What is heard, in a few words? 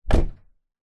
vehicles suv door close in garage nissan patrol